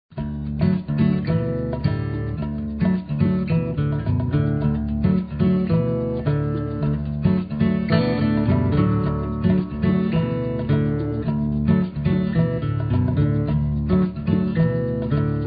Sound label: New Jazz; on hold message music